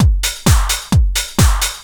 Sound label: straight, Techno Trance Essentials drum loops